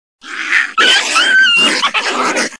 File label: Coyote and Raccoon